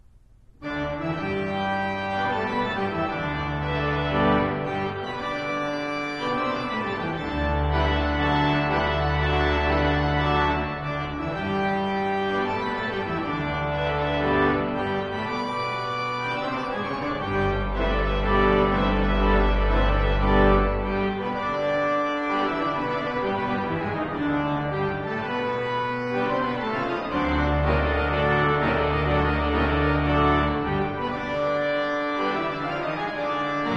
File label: Chorale Preludes; John Weaver